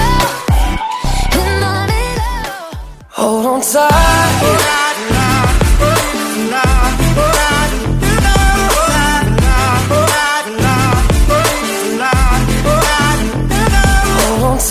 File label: electro ringtone